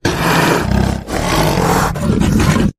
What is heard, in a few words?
Fear Vicious Animal Breaths